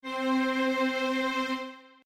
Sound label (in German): oktav